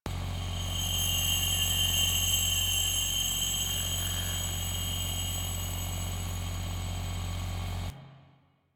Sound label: Capsule Cleansed Loop; Warframe; Missions; Zariman; Zariman Survival